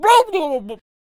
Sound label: Potionseller Attack Vocals